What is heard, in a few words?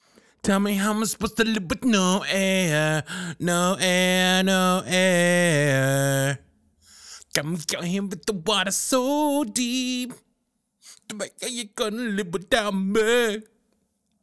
Ringtone